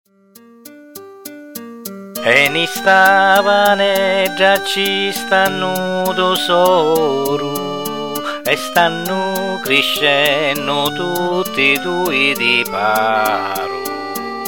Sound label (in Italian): nota voce strumenti; canzoni favara; narisa